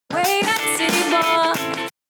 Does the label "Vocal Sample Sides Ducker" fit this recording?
Yes